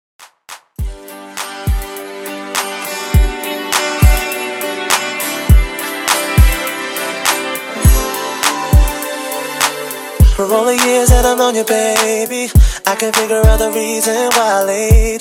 90s Re Drum In Outro 102 BPM

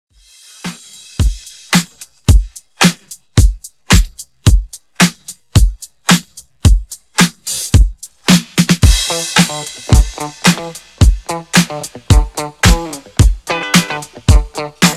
Redrum 110 Bpm

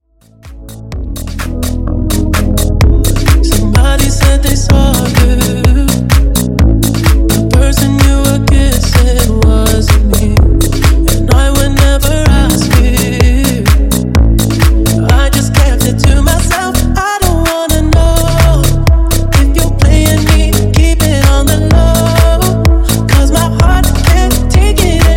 Remix Funk Bootleg 127Bpm

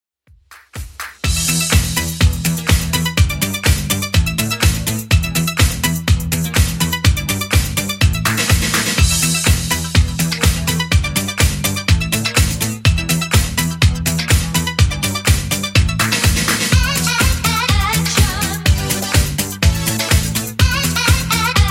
80s Redrum 124bpm

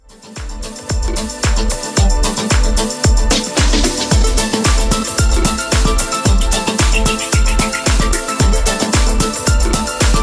Classic Mix 112 Bpm

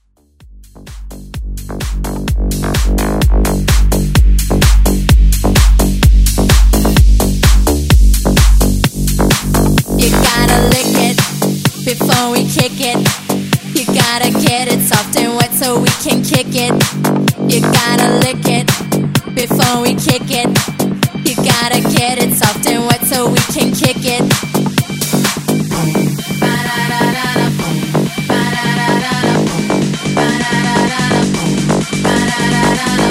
Mash Up

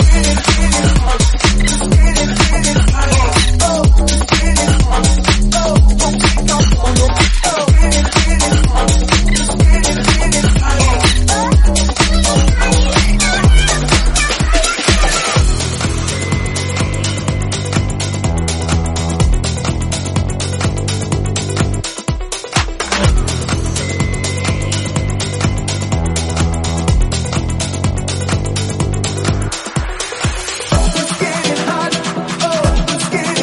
House 125bpm Em

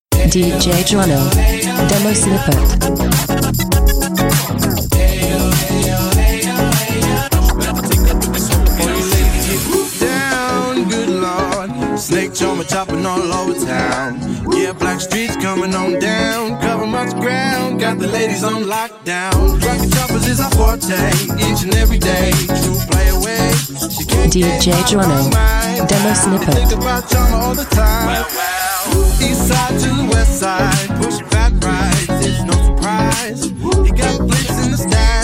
Funk

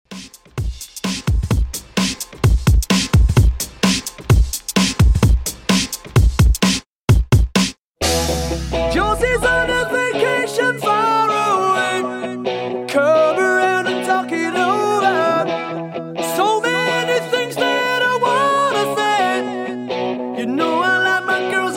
80s Rock 129Bpm

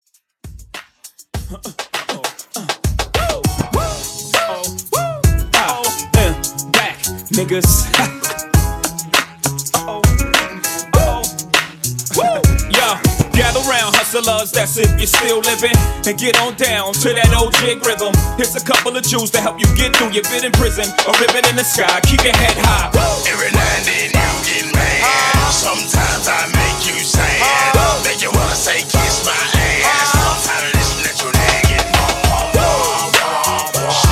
2000s Rap 100 BPM; Quick In Outro

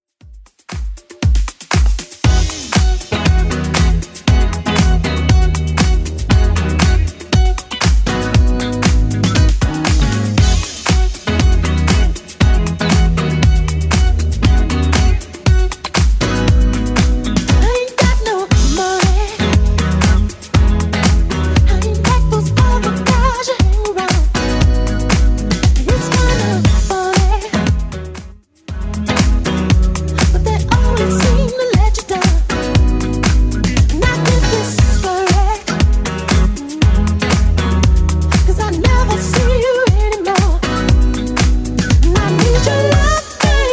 70s Club Edit 118bpm

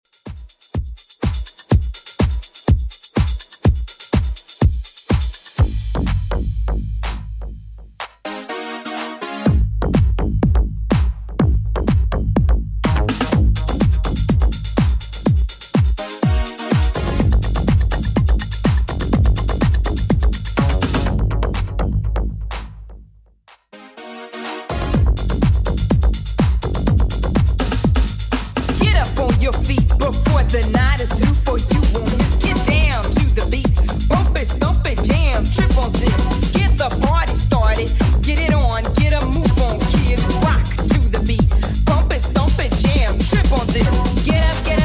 90s Redrum 124bpm

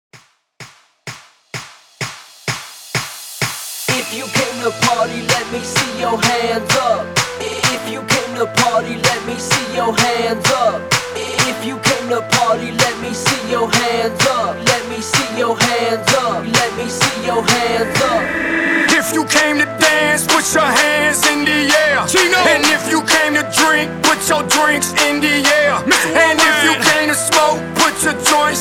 PARTY STARTER 128 BPM

